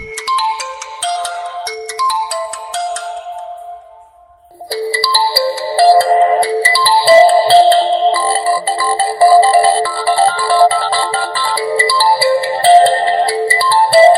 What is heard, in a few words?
Oppo ringtones mobile; K9